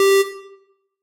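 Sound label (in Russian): громкий резкий грубый звуковой сигнал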